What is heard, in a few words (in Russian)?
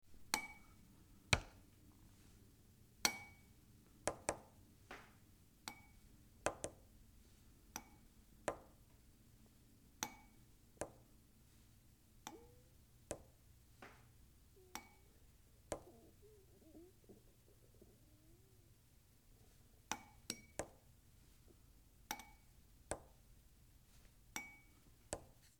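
zvuki perevoi ruchki i chernilnitsy, quill pen and inkwell sounds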